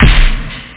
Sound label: DRUM; Loop Scream Tracker Sample